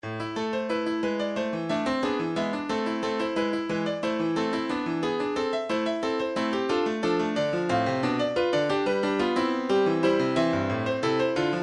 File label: piano, midi; piano version of bachs two part inventions